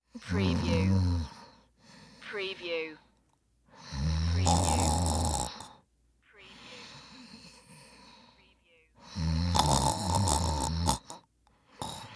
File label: Snore Sleep; sound effects bible